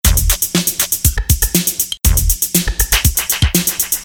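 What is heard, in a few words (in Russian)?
соло на барабанах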